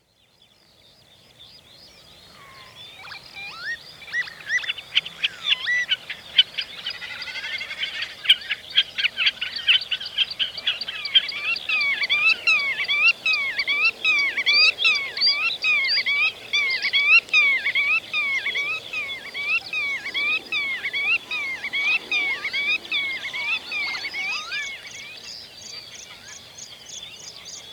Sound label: Sounds of Spieljunken